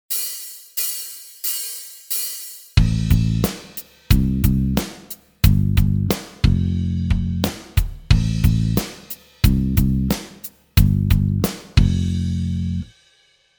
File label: powerchords; total guitar; play guitar now